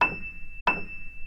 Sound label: E7 piano, pianosound